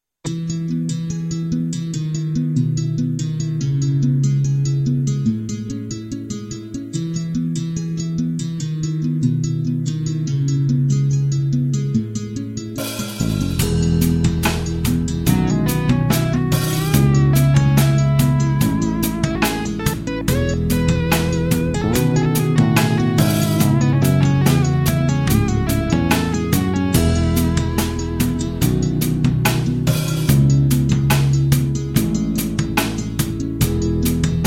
melodia

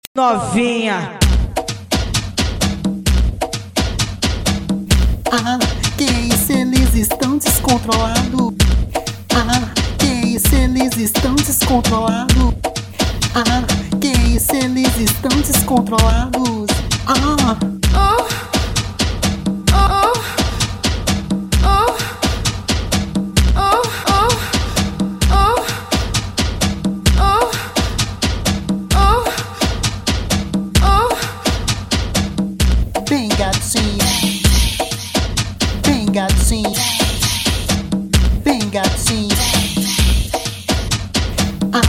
funk brasil

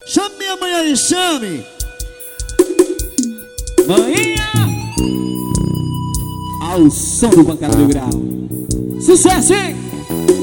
ao vivo médios graves; nailton cds

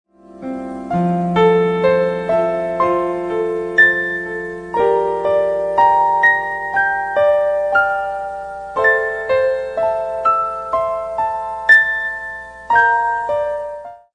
ringtone suave

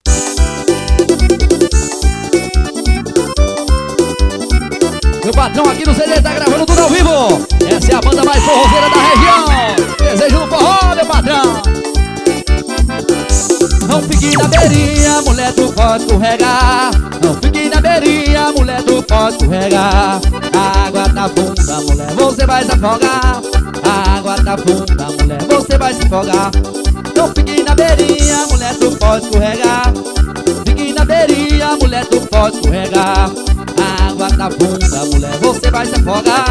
forro

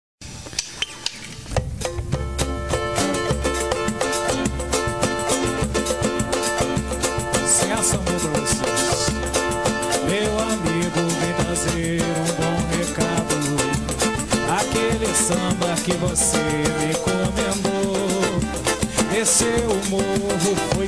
samba de terreiro ao vivo; tv horizonte

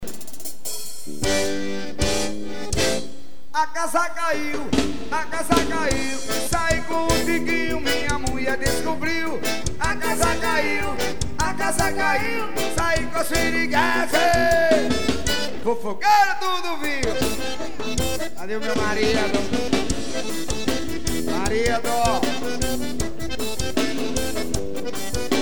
forró xote

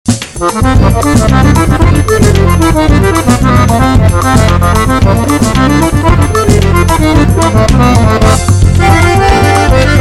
forró

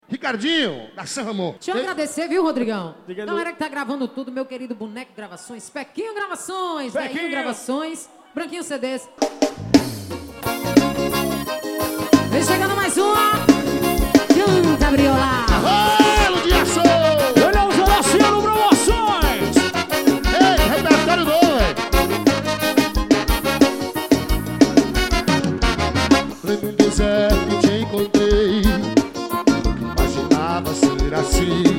forro

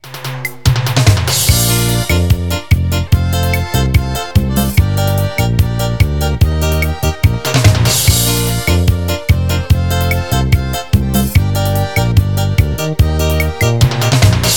forro garrafa pet

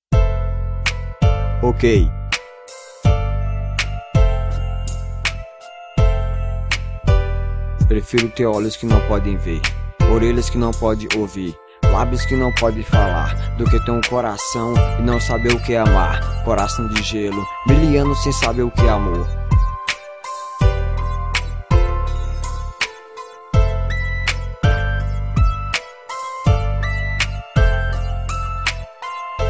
voz do rap

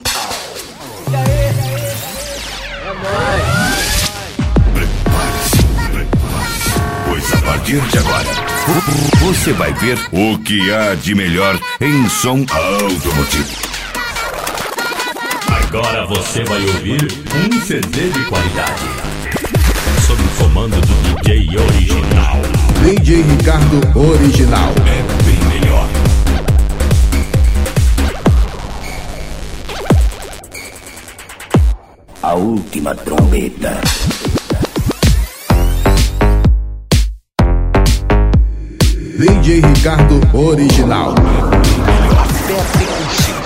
pancada mix

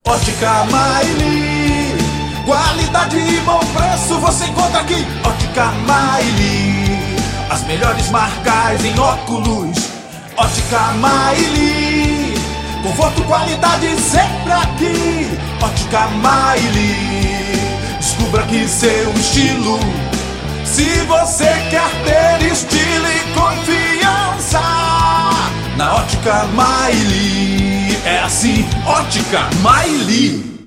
ótica jingle; ótica ma e li

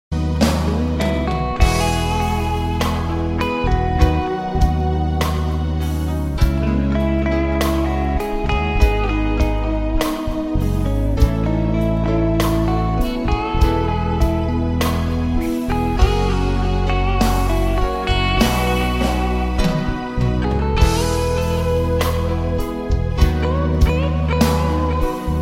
melodia

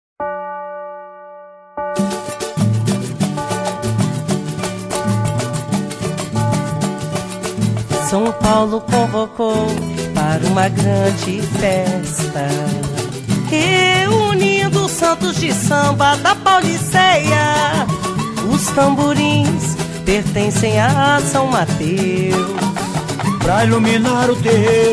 samba

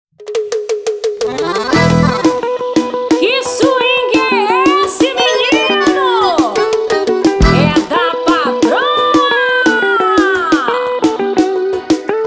som da guitarrinha

